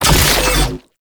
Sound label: Infested Shotgun Reload; Warframe; Weapons; Infested Mercy Shotgun